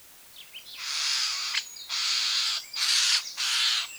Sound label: Common Starling; woods; Ariel Foundation Park